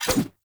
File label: Large Dagger Whoosh; Weapons; Enemy; Daggers